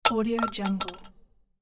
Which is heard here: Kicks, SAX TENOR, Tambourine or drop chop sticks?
drop chop sticks